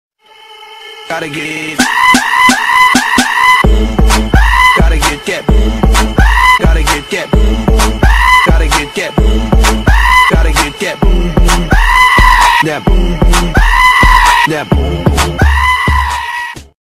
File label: Boom Boom Crazy Tone